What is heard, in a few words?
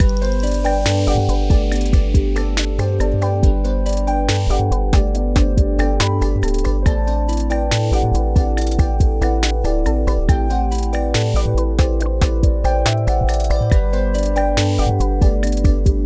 Loop, Kawaii Love Lofi Music; Kawaii Love Lofi Music Pack 2